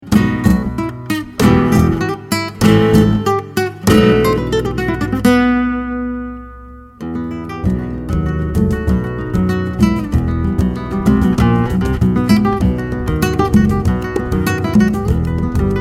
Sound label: flamenco